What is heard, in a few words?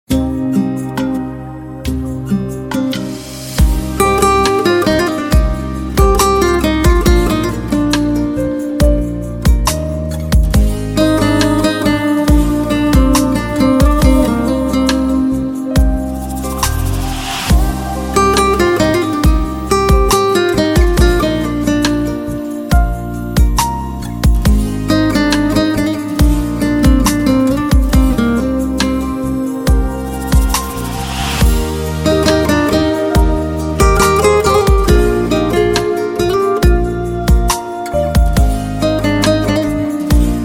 Guitar Instrumental